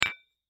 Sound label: Light Bulb Impact, Foley Light Bulb Impact Hit Soft Glass; Household; Blastwave